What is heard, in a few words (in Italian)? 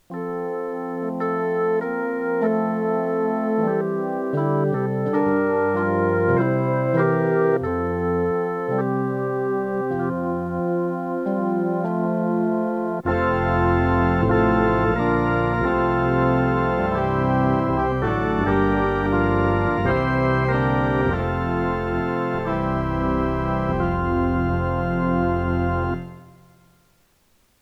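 Salmo organ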